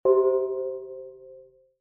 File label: tone